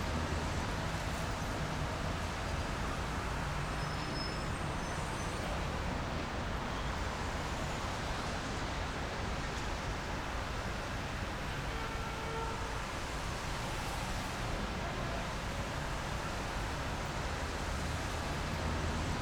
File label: traffic distant loop; Film Noir; SoundPad